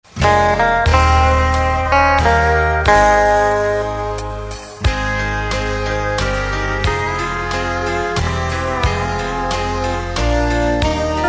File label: low lead